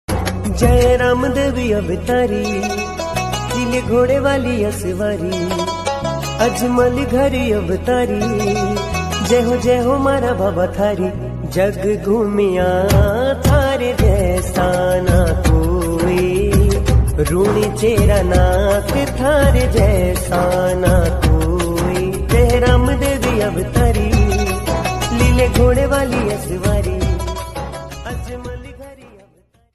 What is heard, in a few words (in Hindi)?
ramdevji ringtone bhakti ringtone